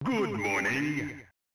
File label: Boot; Tekken 2; Namco System 11 arcade